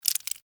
Insect Claw Wet, Insect, Claw, Wet, Juicy, Snap, Movement, Bug; Insect Claws; Sound Effects:Animals:Insects; Blastwave